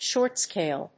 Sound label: short scale